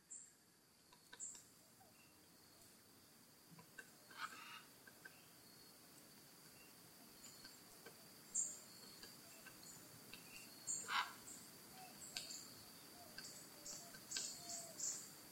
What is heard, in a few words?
Dawn Costa Rica Rain Forest, Birds in the Rainforest; Costa Rica Rain Foest; naturescapes